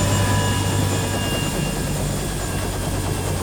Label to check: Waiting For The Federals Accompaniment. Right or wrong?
Wrong